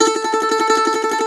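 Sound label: MANDOLIN TREMOLO, Plucked Strings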